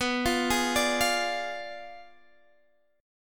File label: B Diminished 7th B, guitar